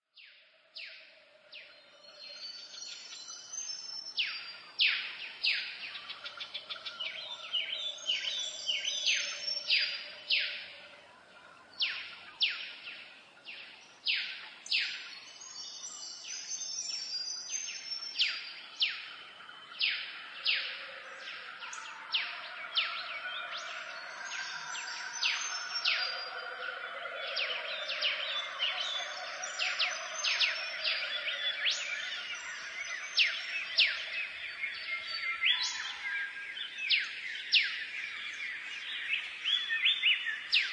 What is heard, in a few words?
Sounds of nature tall Forest